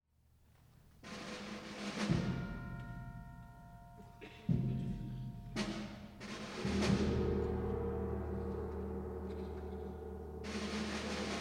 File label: Taps; 4th of July 2016